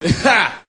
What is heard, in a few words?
"ah ha"; Voice; ooh ahh